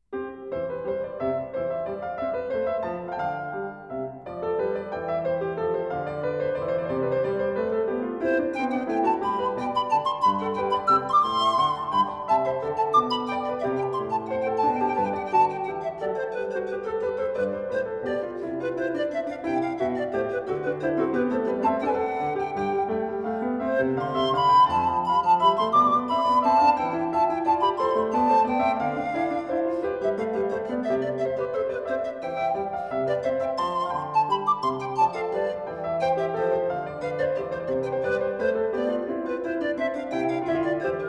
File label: recital